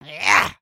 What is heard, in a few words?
Attack, creatures organic